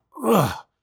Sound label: Efforts, People Sounds; Foley Library; Judge John Hodgman